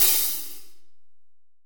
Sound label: AMB OPHH, Drum Kit, AMBIENCE; AKAI S6000 CD-ROM - Volume 3; AMBIENCE KIT1